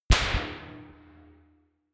gun slapping pop gun ii; schusswaffen

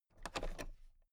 toolbox latch open soft; industrie wirtschaft; handwerk

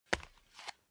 step barefoot wood parquet single left; schritte

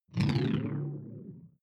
sci-fi alien big bionics; swooshes-swishes

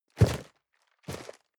cardboard box medium turn hard; objekte

